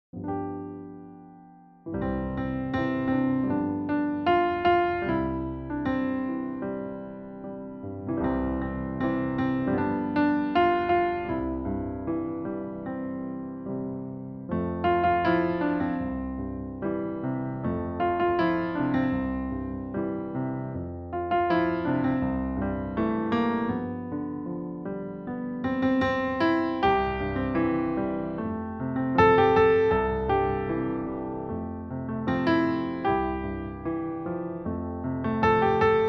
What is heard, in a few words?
piano